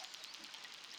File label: Background Noise Clips